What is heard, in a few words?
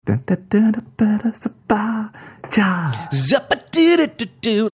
singing jazzy version mystery song